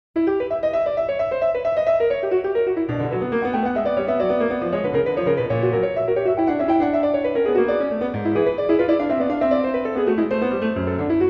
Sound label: Fugue X, e-moll, Well-Tempered Clavier; J.S. Bach; BWV 855